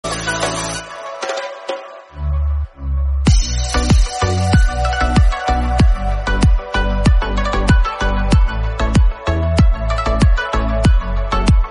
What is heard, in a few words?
bgm